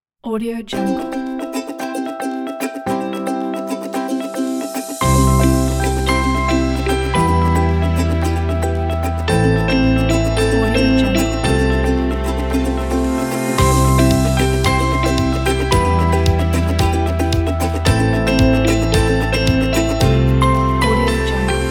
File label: fun and happy ukulele